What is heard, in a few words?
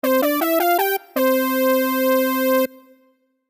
solo